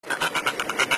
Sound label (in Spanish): helicoptero